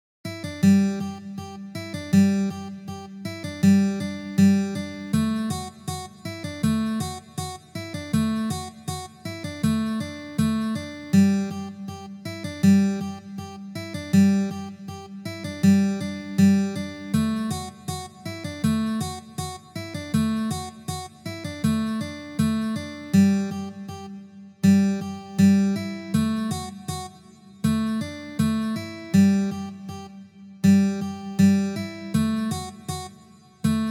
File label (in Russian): na gitare; Sobachij vals